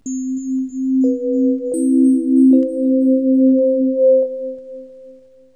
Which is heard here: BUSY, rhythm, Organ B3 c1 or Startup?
Startup